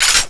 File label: clip in; weapons; bufffiveseven; cstrike